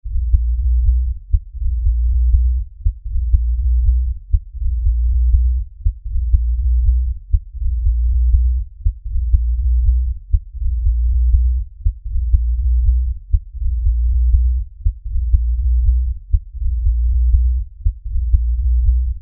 Lfe Pulse Low Bass; Drone

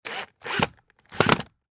Drill Electric Into Wood; Drill Electric Tighten Screw Into Wood Hard; Drills; Blastwave

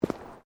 Footsteps womens low boots concrete step; Footstep Foley; Footsteps (Sound Effects:Human)

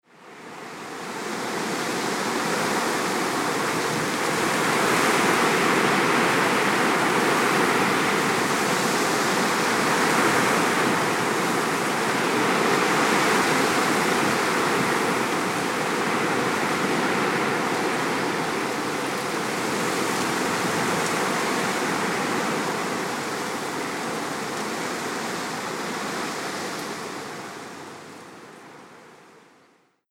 Wind In The Woods; Outside Ambience; Andrew Fleming

